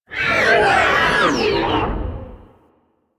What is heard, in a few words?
Sentient Ship Attack Maneuver; Warframe; Enemy Ships; Space Fighter Sentient Coil Agent